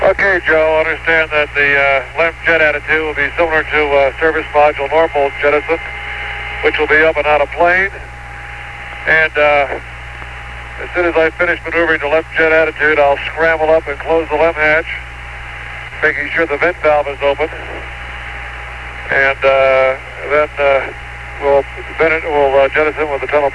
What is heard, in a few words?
hatch